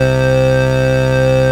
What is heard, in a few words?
SIEL, String Machines